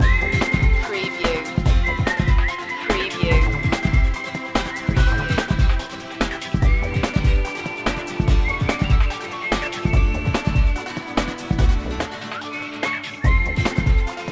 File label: playful march loop